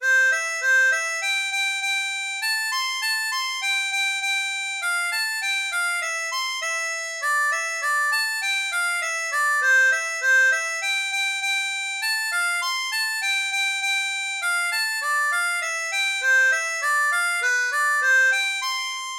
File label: Surprise, harmonica